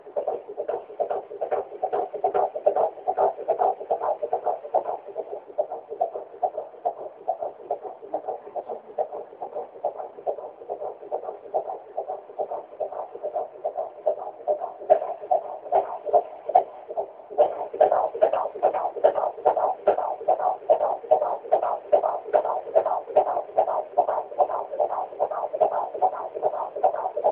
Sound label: baby heartbeat